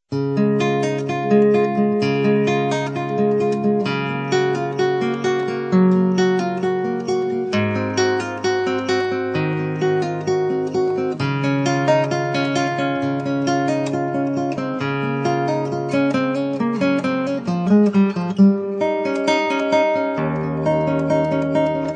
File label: cello